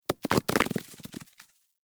Barrel Apple Spill; Objects

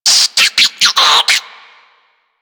Infested Minion Bark; Warframe

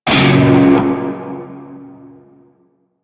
Shawzin Yo Chord A; Music; Warframe; Narmer Shawzin

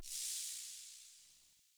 Ship Descent Elec FX; Ships

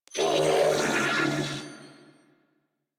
Death, Female; New War; Narmer Grineer

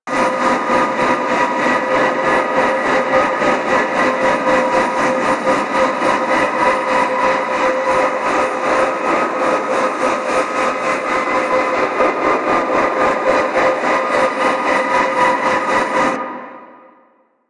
Archwing Healer Engine Loop Idle, Vehicles; Warframe

